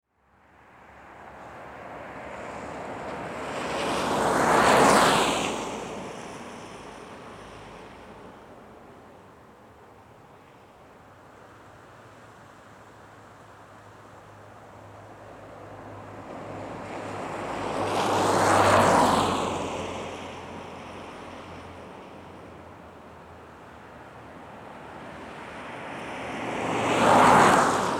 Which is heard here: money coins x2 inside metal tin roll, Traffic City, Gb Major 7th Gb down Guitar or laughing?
Traffic City